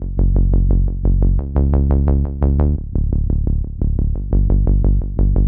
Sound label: Drum Bass